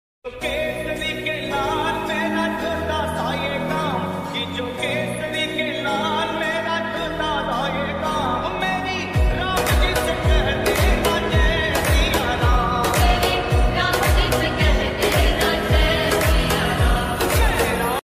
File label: bhakti ringtone; hanuman ji